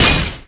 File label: impact generic single